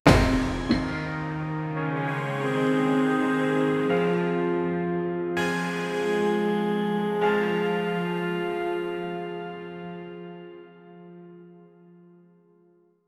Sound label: epic soundtrack to anime